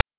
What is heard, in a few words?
PITCHED NOISE; NES DPCM; MIDINES